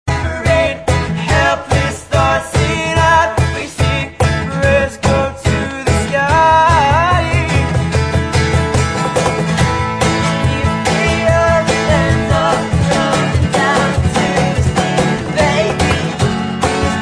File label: acoustic